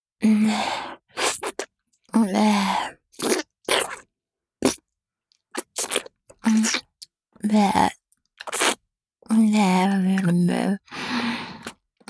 チュパ音, ASMR; 包皮の剥けた亀頭を舐める

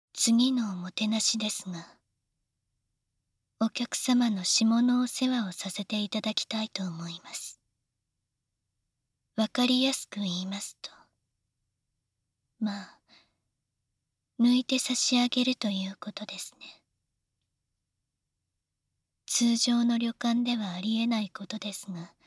耳舐め手コキ; 効果音あり

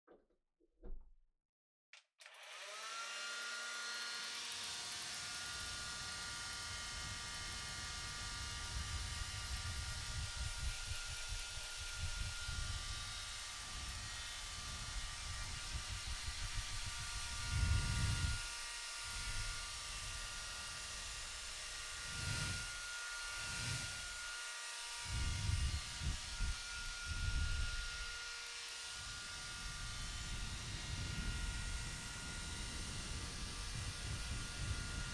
ドライヤーをあてる; 3DIO; お風呂に関する音

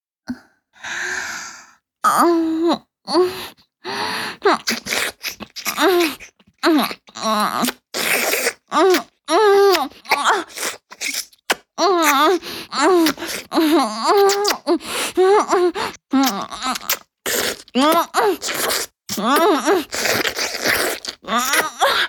フェラチオ(中):頭を前後に往復して陰茎をしゃぶる, チュパ音, ASMR; チュパ音抜粋集

